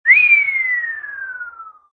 fly

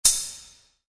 rollover; camp rock back to school

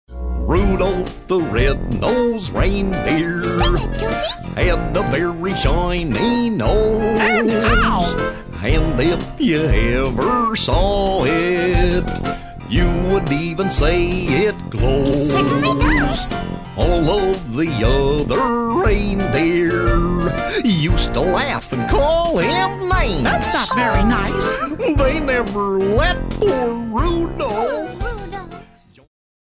karaoke vocal; christmas favorites; disney

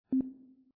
mouse over; fairies

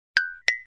blink; disney; mashup

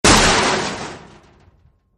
metal crash short; Wreck-It Ralph

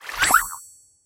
flower blooms; activity center; backyard; preschool time

